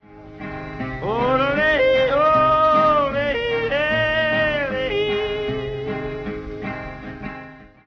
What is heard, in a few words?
yodel